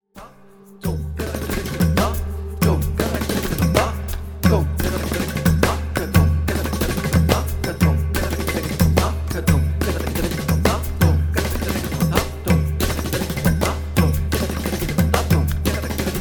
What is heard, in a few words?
Fill The Fives w drums